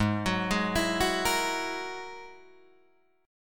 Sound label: Ab 7th Suspended 2nd Sharp 5th, Guitar, Drop D